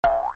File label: Jump